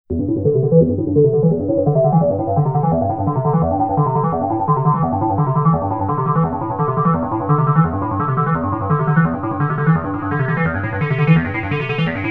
vcs3

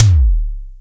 Fat Kick, SDSV Fat Kick; Simmons Drums

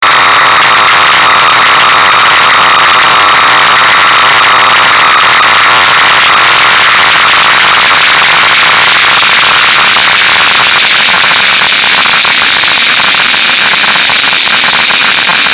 Clk Out; wiard

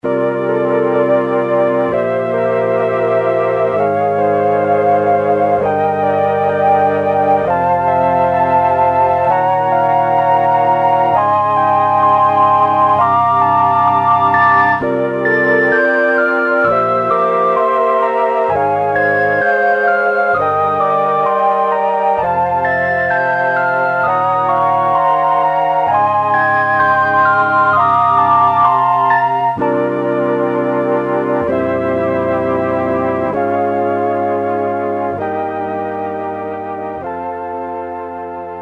Asc Desc Tron Flutes, Mellotronists; part 2 takes up the same chords, this time played on flutes; Michael Peters

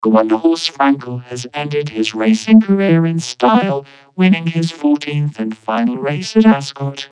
vocoder

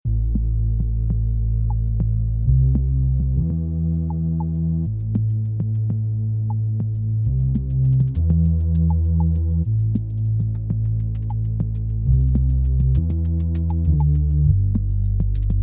Xpander; Demo; noiseprofessor

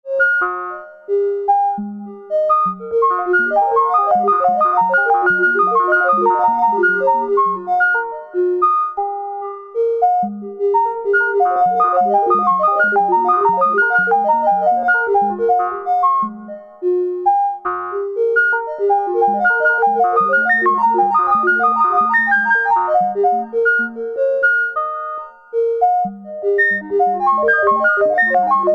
Arp Meantone External Clock

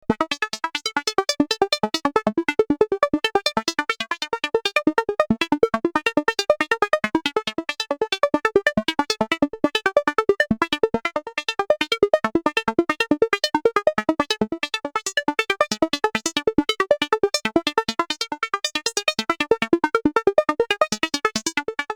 Boogie Banjo 6&24dB; Wiard; wiardgroup

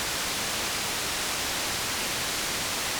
12dB, cutoff 6, res 0, jumpers 2, 5, Q150; Q150 slope jumpers

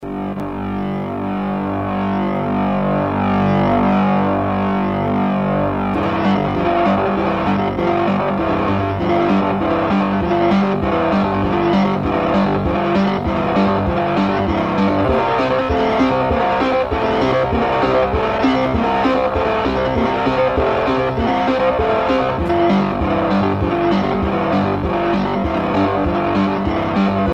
beam modulator; Ken Stone Recordings; cgs synth

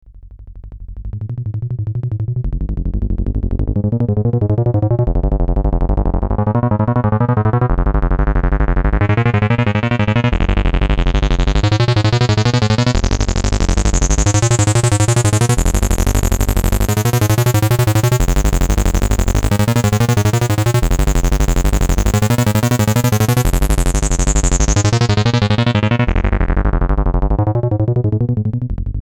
boogie; wiard

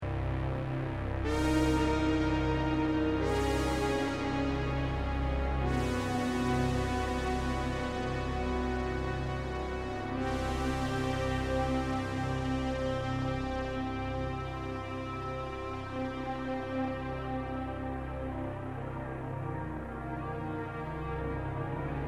CS80 Chorus Studio

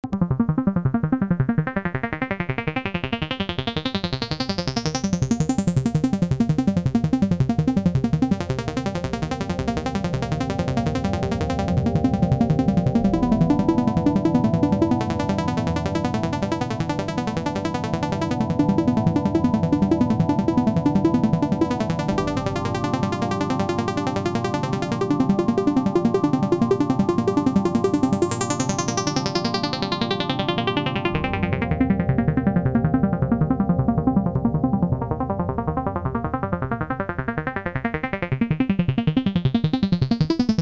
Boogie riff; Wiard; wiardgroup

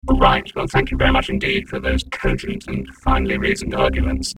vocoder

